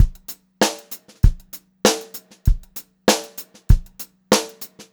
FUNKY BEAT, Funk-Groove Drumloops

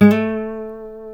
A 3C nylon; HANS NYLON; Guitars

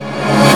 REVRS HIT; AKAI S-Series CD-ROM Sound Library VOL-1

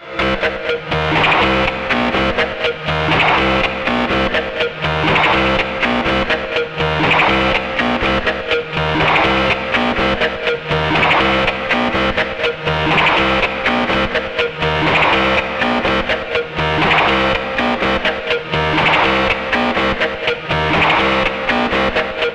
C1, Synth Line; 90 s Sample CDs; NiceBeats; SynthLine VOL-13

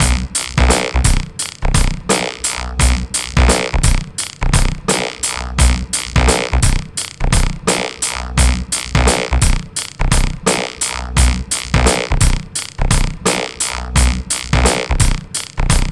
86 bpm; TRIBE VIBE; 90 s Sample CDs; Planet of the Breaks

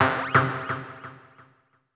C3, SynthLine; 90 s Sample CDs; NiceBeats; SynthLine VOL-4

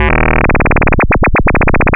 LFO, Noise

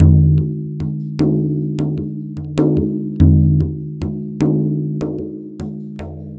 Frame Drum, Ethnic Percussion; Sonic Foundry (Sony Creative Software); Ambient Atmospheres & Rhythms

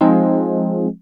wurlitzer, dx7

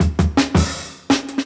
165, Jungle Breaks; 90s Sample CDs; Original Jungle Breaks

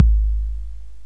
mc303 kick; Drum Hits; BASSDRUM

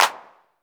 909 HANDCLAP, TR-909; Crypton - DrumBase5000

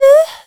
VOC F, Dance Vocals, FEMALE

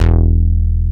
Moog bass; Greytsounds VOL-1

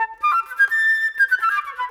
Flute; Sonic Foundry (Sony Creative Software); Orchestral VOL-2; Modern 27

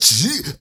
MALE SOLO, MALE NOISE, Heart of Africa

